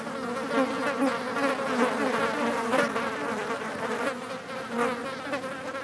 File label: fly swarm